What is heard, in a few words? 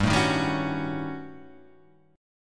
D sharp 7th Sharp 9th Flat 5th